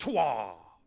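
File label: Swordsman Fort Vox; Civ4 warlords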